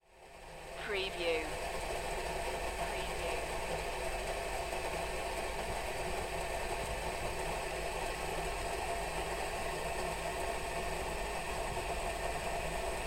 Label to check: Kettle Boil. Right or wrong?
Right